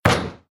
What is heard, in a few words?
Basket Rim